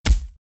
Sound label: impact; Power Rangers vs Teenage Mutant Ninja Turtles - Ultimate Hero Clash 2